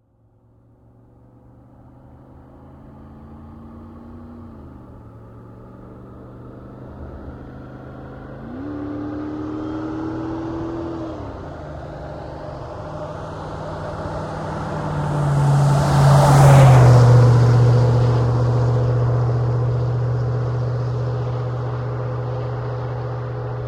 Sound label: Jeep Grand Cherokee WJ 4.7 exterior wet asphalt passby 80kmph, V8 Magnaflow; stereo M10